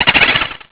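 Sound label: castle boo laugh simple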